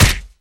pelea

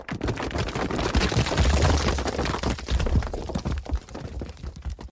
picar desprendimiento

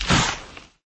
caida

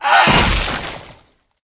caida

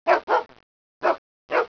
perro

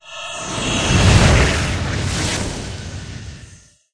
hoja de llamas; elemental

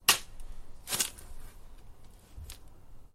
cavar

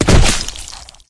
caida

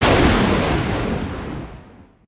llamar rayos inicio, hechizos

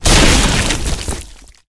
caida

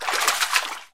pescar fallo

